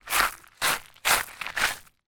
foley footsteps gravel drag lazy x4

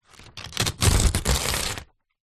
notepad small binded page rip tear out; foley; zapsplat

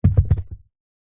wood small heavy dense piece roll on carpet; foley

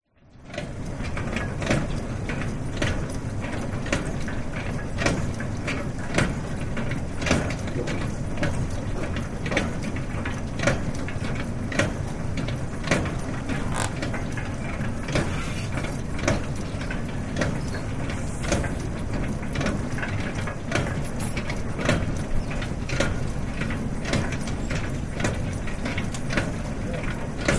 Conveyor Belt

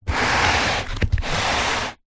foley foam camping mat slide on ground

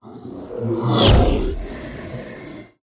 whoosh; Audio Mangler

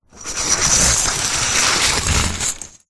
hospital ward cubicle curtain open or close

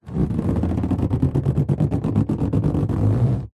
balloon inflated handle creak muffled; foley; zapsplat

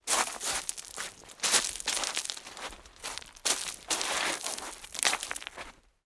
foley footsteps beach shingle sand

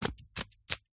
office scissors cutting paper

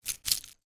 household plastic jewelry movement in hand

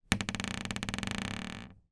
household door creak small wooden

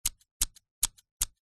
office scissors snips close up; zapsplat

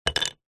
foley wood pieces small kindling set down

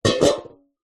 household bowl metal water inside set down

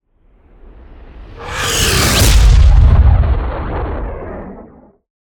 Whooshes Impacts Impact; soundbits

